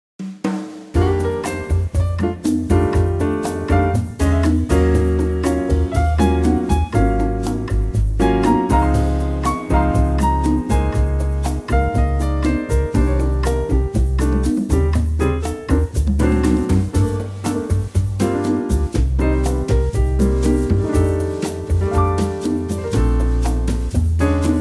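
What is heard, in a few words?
Gm Bossa Acoustic Trio 120 bpm, male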